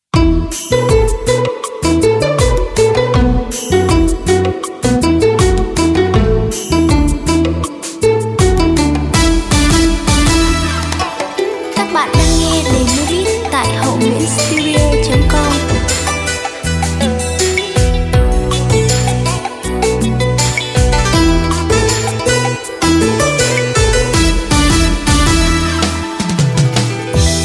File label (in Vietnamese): Con dieu cua me beat